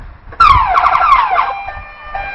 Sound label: Ambulance